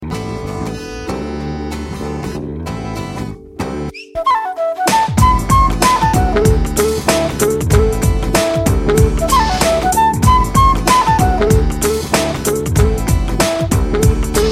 abcd flute

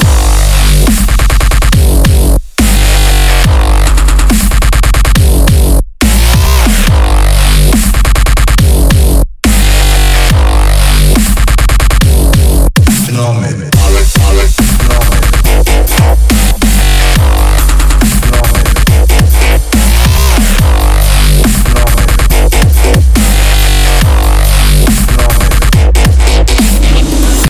atomic dubstep

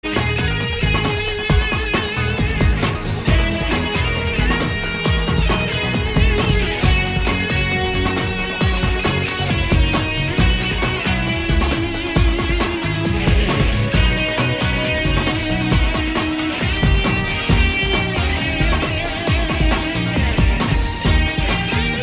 rocking guitar; длительность 0:29

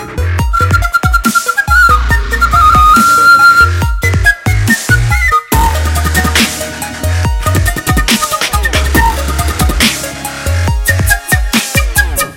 flute electro

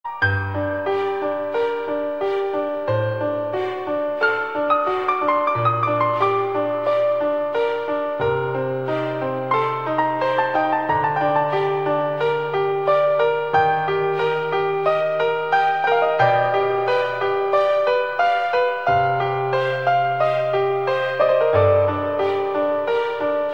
supreme piano

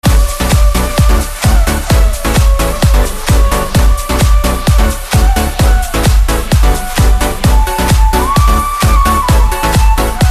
rhythm flute

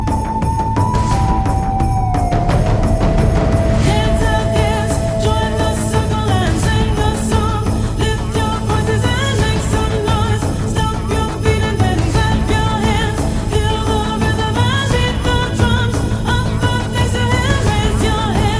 native american dance

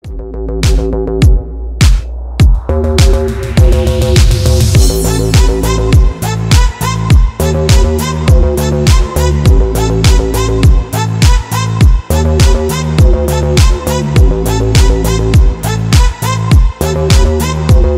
instrumental

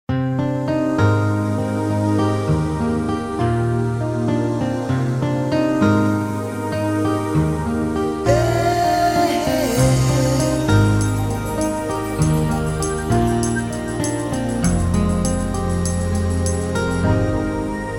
inspire music

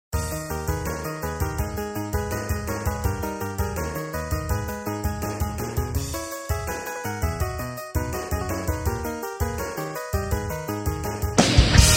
tetris metal

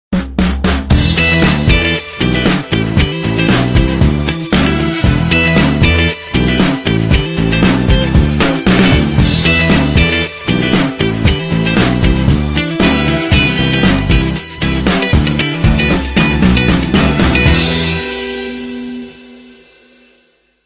nokia sensation

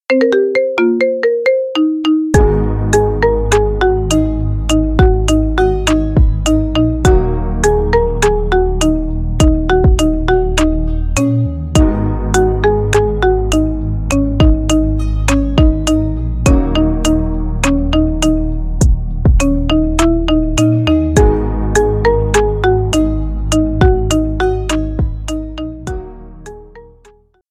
marimba remix

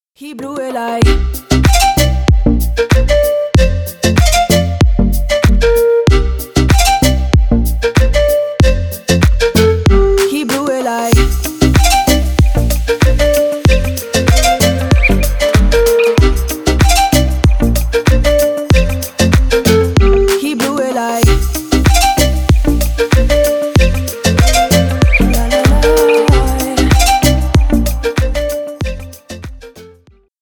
master of the flute

